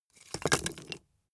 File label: wood logs small fall